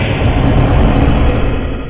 vent

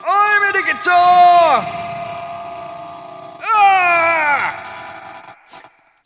guitar

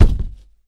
plop

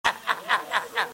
hahahaha

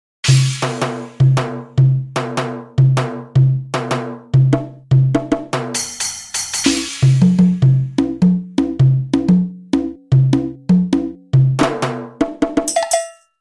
real percussion

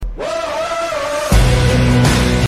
follow alert

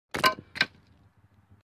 wood tile

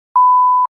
beep

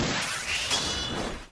hook

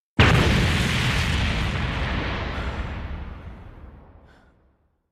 explosion

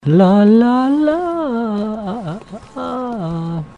lalalaa

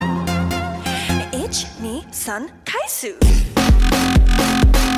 live performance; braveheart

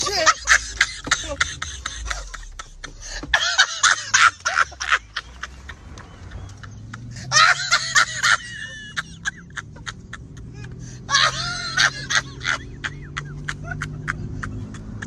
crash laugh full

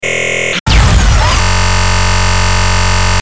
booooom error

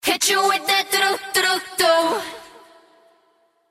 dududu

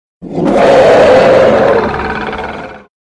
dragon

